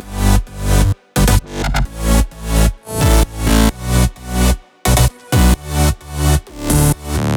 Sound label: 128BPM Melody Root G; Vengeance Samples; Face to Face Kit